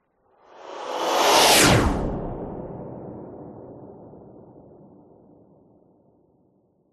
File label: science fiction space fighter fly past very fast whizz by